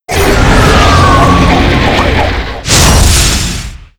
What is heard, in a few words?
Windy Witch Skill; Seer; GamigoNA